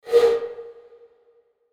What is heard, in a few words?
Whoosh Fast Bamboo Flute Breath; Blastwave; Whoosh Sound Effects